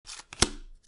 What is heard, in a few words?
played card; theprimeagen spire